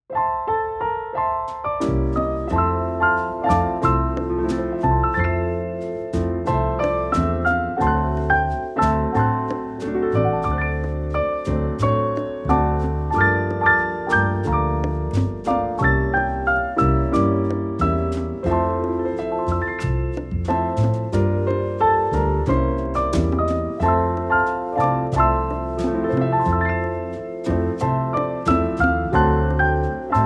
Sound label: Radio Instrumental